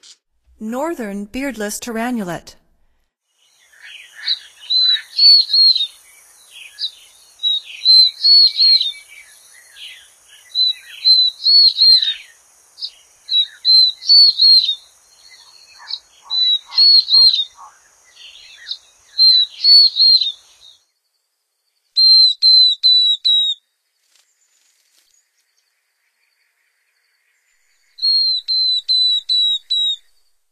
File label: Northern Beardless-Tyrannulet; North America; Stokes